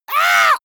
monster painful cry high

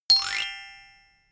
notification glockenspiel slide up hard

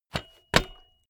bmx bike pedal grip double regular

